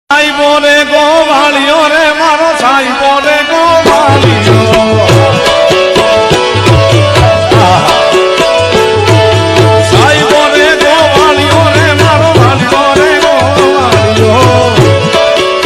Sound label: male ringtone